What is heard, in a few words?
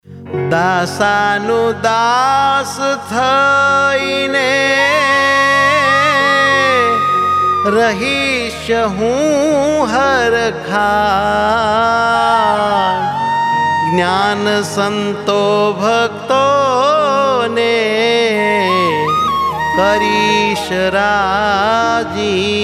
DOHO WITH FLUTE